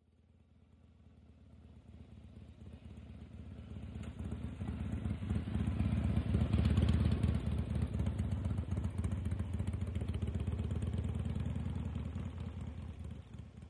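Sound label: Motorcycle Harley By Very; harley-davidson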